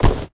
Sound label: padding run